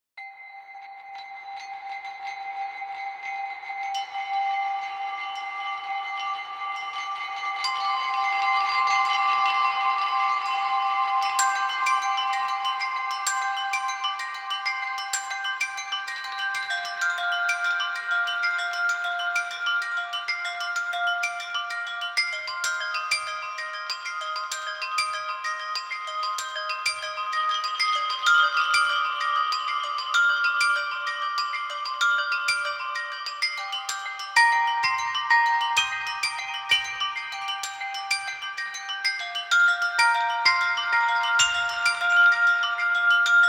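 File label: flowing, whisper strings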